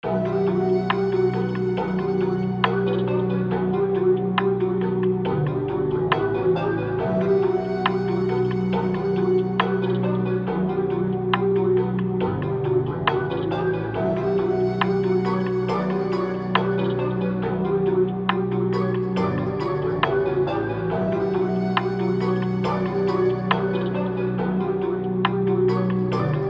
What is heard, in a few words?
Melody Loop Fmin 138 BPM